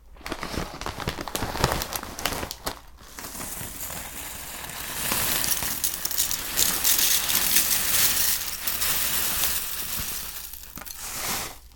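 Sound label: cereal; eating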